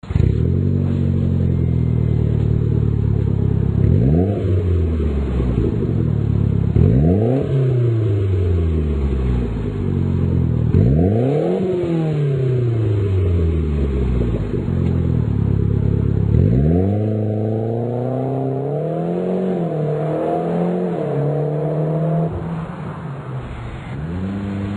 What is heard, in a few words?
magnaflow exhaust systems